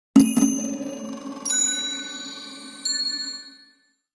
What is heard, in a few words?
bells short; ringtones